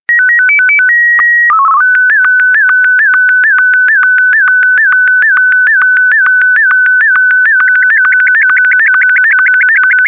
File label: SSTV